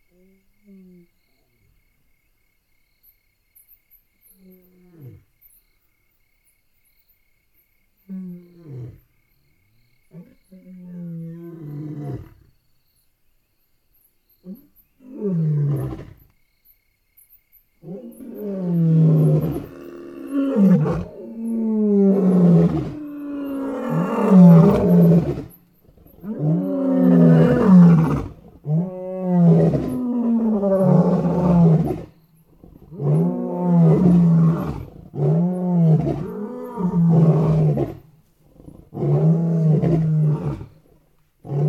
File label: Roar